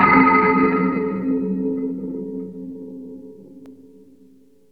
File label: woodblock, Roland R-8